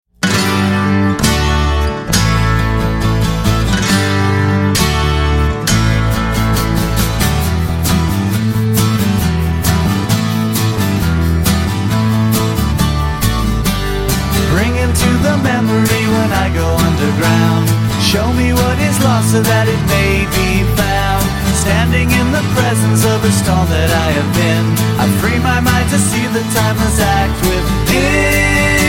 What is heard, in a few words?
Acoustic Version